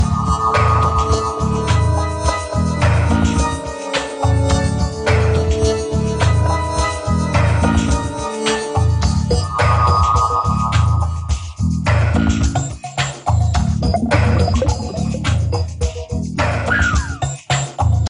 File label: Space Level